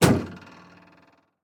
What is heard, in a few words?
Semi Truck Mac; Blastwave